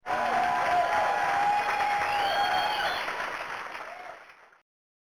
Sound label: Cheers; boogie wings arcade gamerip 1992